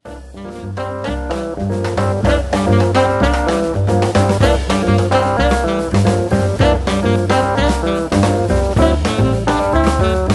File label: salsita